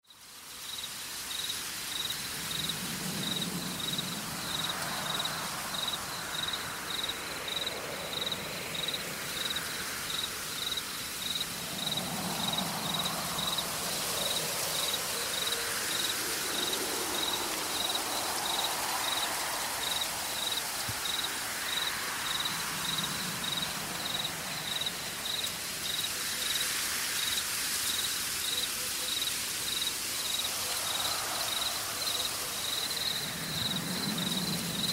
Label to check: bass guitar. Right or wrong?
Wrong